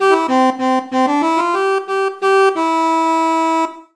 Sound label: dixie; unreal tournament 2004; fav UT sounds